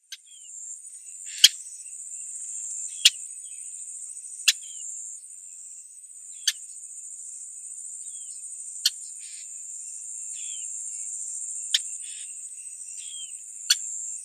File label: Lesser Moorhen calls